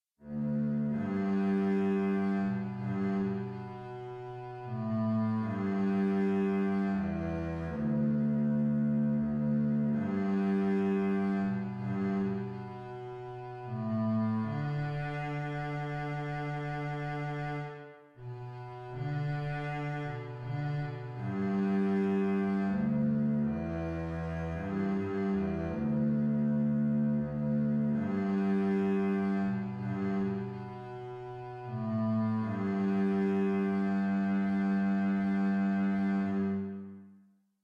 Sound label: bass